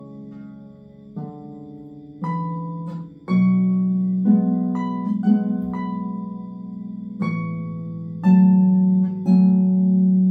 Morning Mists Harp Part

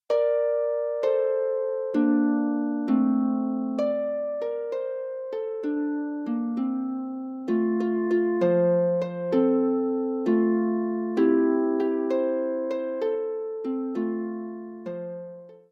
Harp, The Bittern from the Peaks; Tudfuls Harp; Deryn y Bwn or Bannau

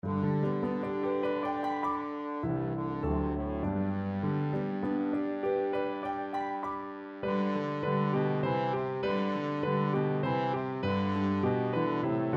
Trio Trombone; Come Shepherds Rise

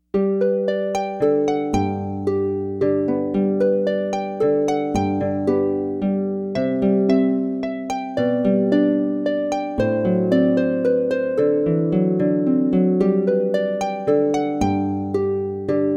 Minuet in G Major, solo lever or pedal harp

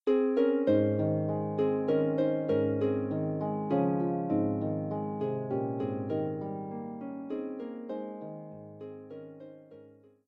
Harp

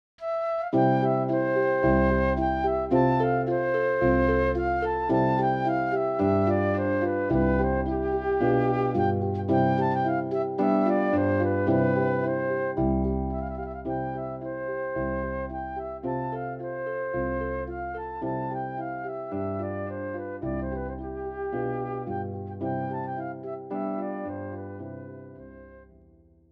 Serenades for Flute and Harp; serenade haydn

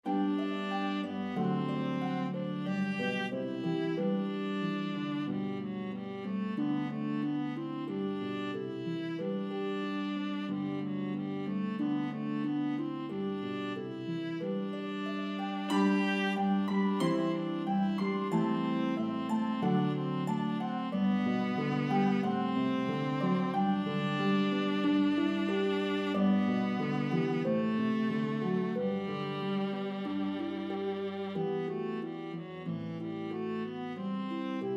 Harp Melody Instrument Viola

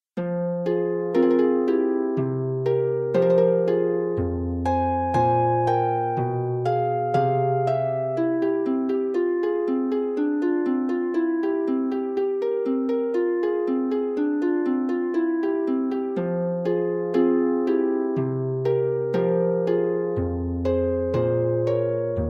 Prelude, harp; Forty Eight Preludes and Fugues Book 2

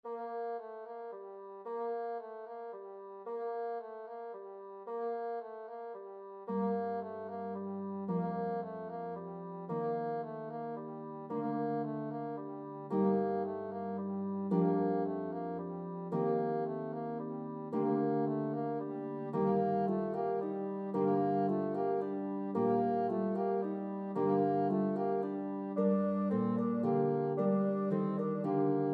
Bassoon and Pedal Harp

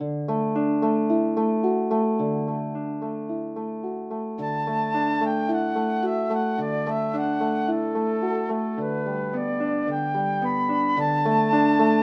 Running Waters Flute

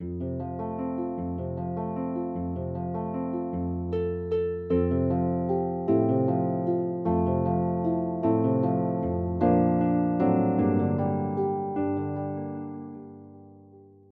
Harp; Famous Music for the Harp Vol 6

